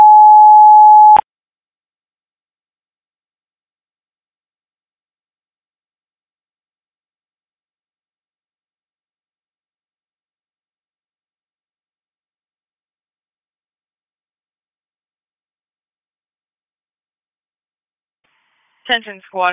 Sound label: Squad, Tone Alerts; Mercer St Henry Squad 3-8; Saint Marys